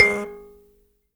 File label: HIBZ KL G#4, HIBZ KALI; Heart of Africa